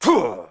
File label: attack, monster; thiefboss2